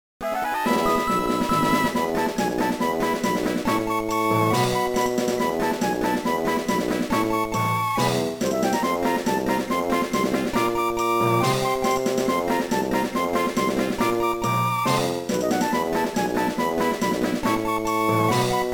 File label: victory, bgm; PokeRogue